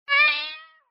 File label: enemy death